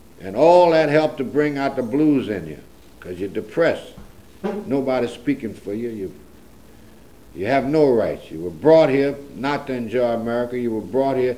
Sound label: Blues